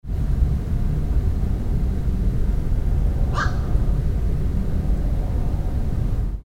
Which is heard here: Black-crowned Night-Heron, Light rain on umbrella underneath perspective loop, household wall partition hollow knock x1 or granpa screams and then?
Black-crowned Night-Heron